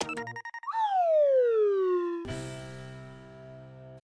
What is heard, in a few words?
show answer game end